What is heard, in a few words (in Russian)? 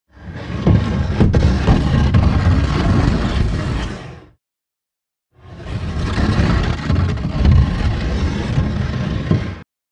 SHkaf dvigajut po polu